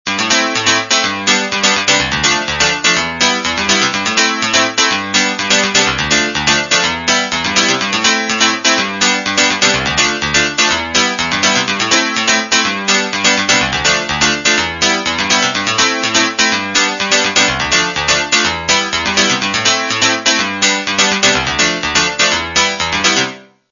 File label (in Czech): klavir; Mirex; Klavφr - upraven²